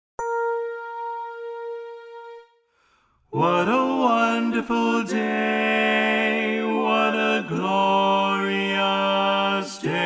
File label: - satb bass